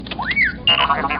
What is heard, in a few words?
squeaks; R2d2